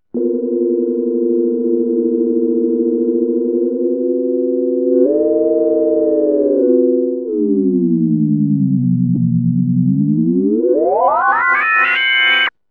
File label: painful noise